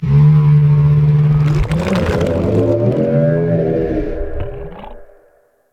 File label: Mushroom Adult; Ambience; Props; Warframe; Venus Open World; Venus Landscape